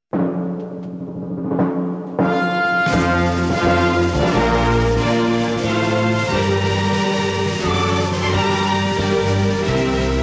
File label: Star Spangled Banner, Traditional Version, Key Bb